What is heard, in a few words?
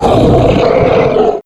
wolf die; wolfteam